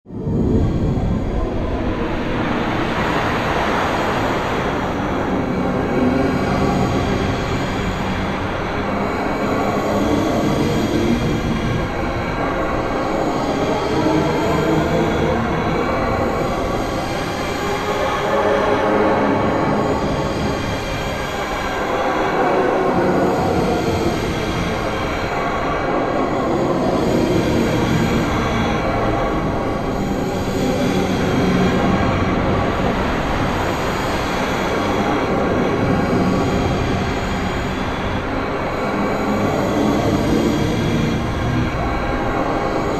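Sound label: space sounds black hole time travel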